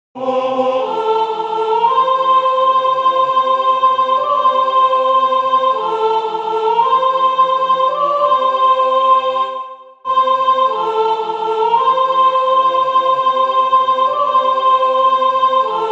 Le berger d Aure soprano

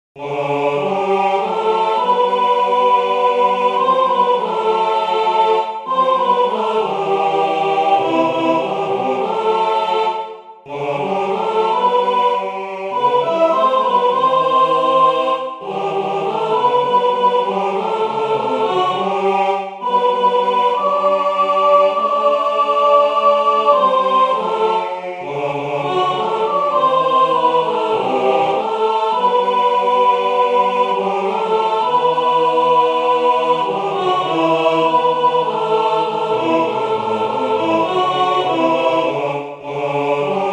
Petit garcon tutti

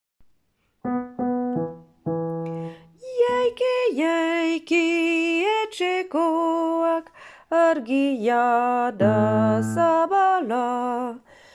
chant basses

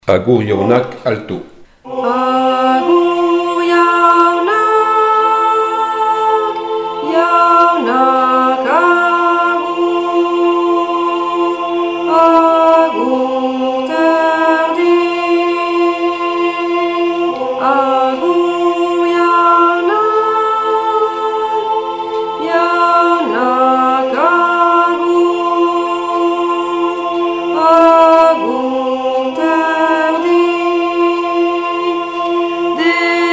chant Alto; Agur Jaunak

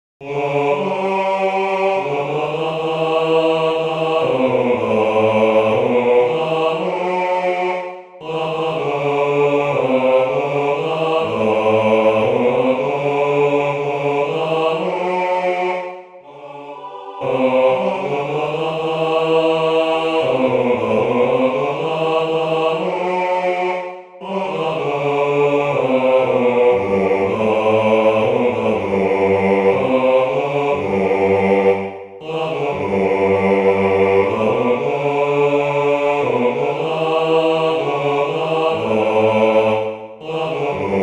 basse

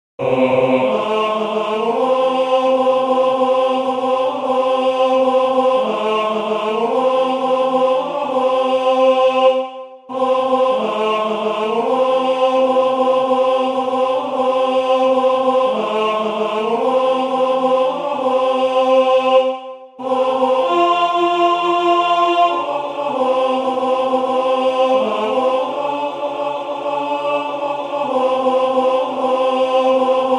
Le berger d Aure tenor

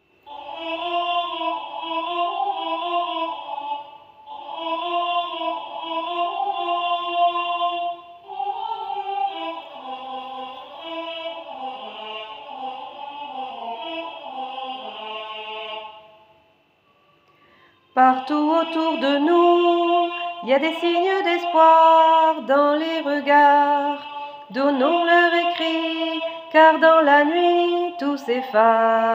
chant alto tenor

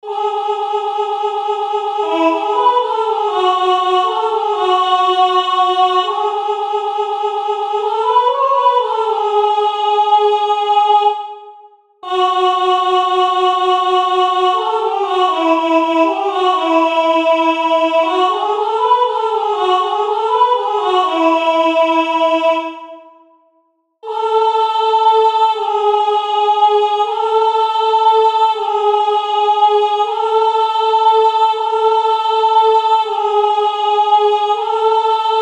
soprano

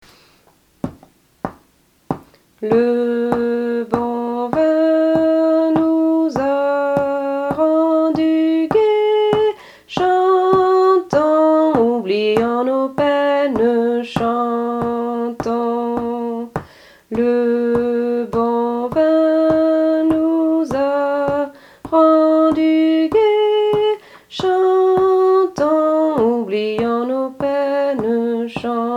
Tourdion Alto chant

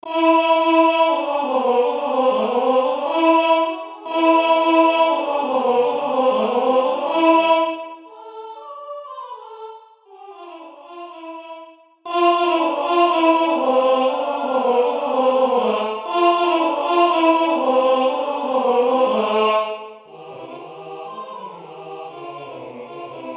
Le printemps tenor

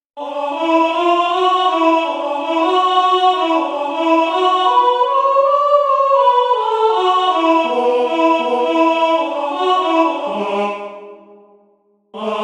alto

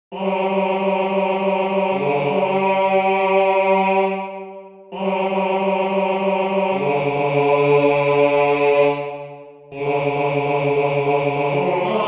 basse

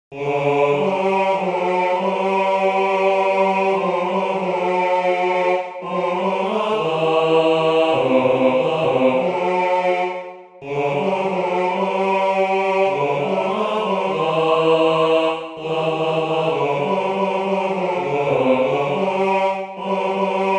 Petit garcon basse

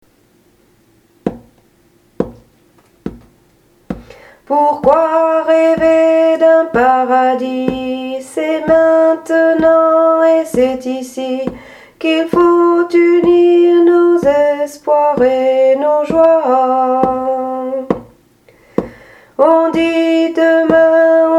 3 voix chant femme

